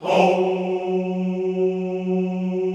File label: HOUUU, Classical Choir